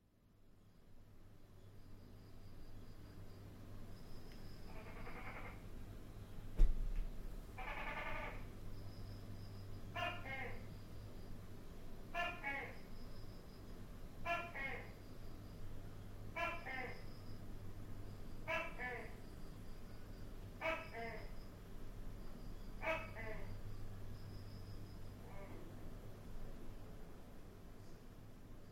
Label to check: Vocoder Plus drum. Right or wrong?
Wrong